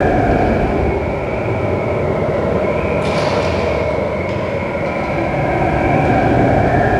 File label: crevice wind; ambience ninjago